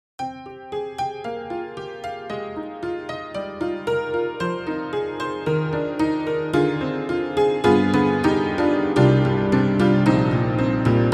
Upright Pianos Barroom Upright Cheers Barroom Upright; Synthogy; Ivory II